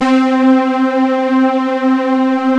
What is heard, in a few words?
Matrix Strings C3; Proteus 2000; Multisamples; Digital Sound Factory Proteus 2000